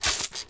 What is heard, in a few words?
Water Splasher